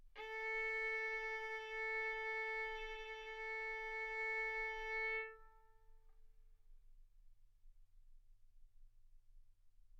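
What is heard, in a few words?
Violin arco mf A string A4 B4 stereo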